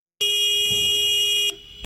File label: honk horn